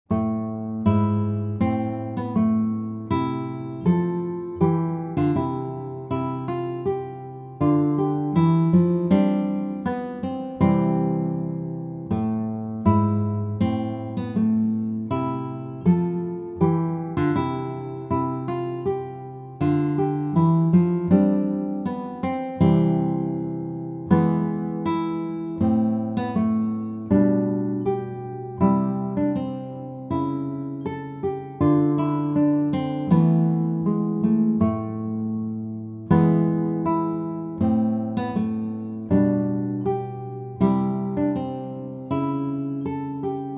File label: Gavotte, guitar